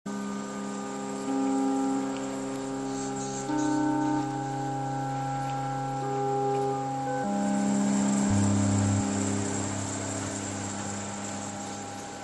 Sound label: elevator drops